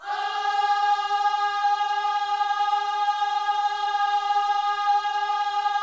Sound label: OHS G#4A, Gospel Ohs; Spectrasonics; Vocal Planet